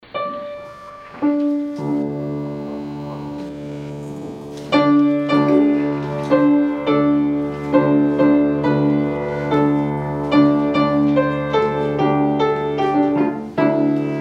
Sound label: Hymn; Sing We Triumphant Hymns